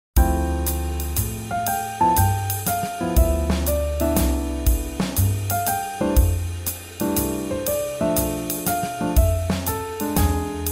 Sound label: Swing Jazz